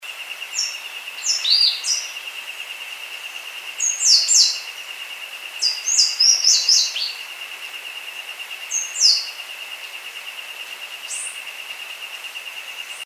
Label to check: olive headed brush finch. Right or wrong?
Right